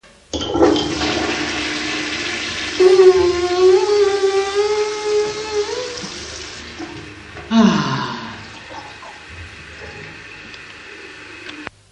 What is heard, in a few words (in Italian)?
effetti sonori; GITA A BERGAMO: commenti live